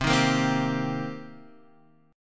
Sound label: C Minor 7th, Guitar, Half Step Down